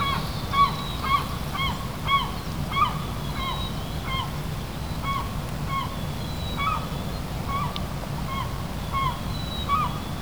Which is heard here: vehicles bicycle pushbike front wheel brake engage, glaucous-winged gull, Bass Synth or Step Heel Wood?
glaucous-winged gull